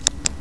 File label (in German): KLICK